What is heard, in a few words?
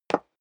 step high heels wood parquet single stair up; schritte